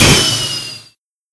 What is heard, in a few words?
afterburner end; tf2